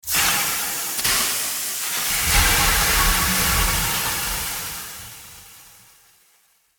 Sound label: Hot pan sizzle water hiss; kitchen